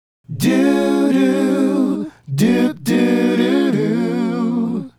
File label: Male Group, Hip-Hop and RnB Vocals